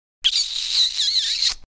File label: intro pinata sfx; without music